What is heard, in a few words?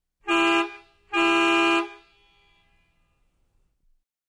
Sedan Car Honk